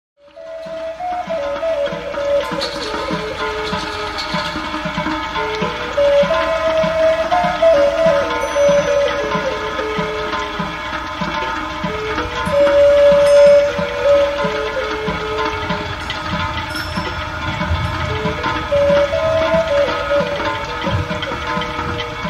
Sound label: natures water drum